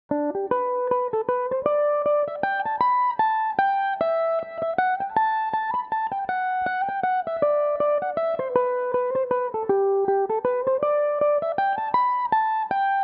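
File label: g major, mandolin; The Pope In The Silver Castle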